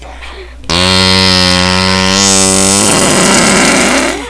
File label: Fart